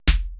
Procussion Mix, EMU E-III; Retro Sampler

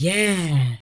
VOX, VOCALS; Ueberschall; Jam Box

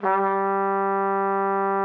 TROP G3, TRUMPET; Xsample VOL-3

